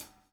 DOWN, DOWN KIT 78

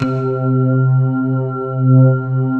MARIM PAD, JV PADS; Pro-Rec - Synthsonic

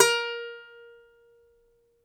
DOMRA A, Prima Domra, Plucked Strings; E-MU Producer Series Vol. 7 – Old World Instruments

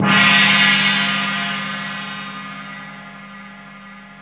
GONG; GONGS+TUBULR

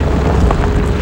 FX, Futurist Drum & Bass

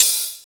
SP12 OPEN HAT; Greytsounds VOL-1

